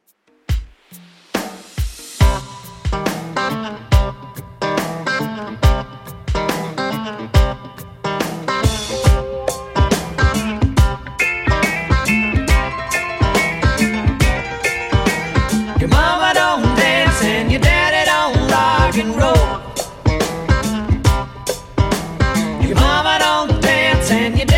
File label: 70s Rock N Roll Redrum 140bpm